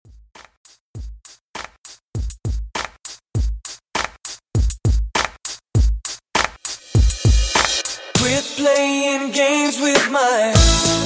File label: Pop Re Drum 100 Bpm